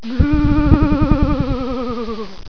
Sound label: moo